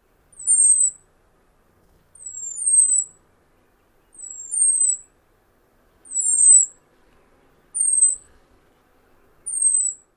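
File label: high whines, Swainson's Thrush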